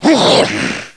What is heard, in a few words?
sprint; hunter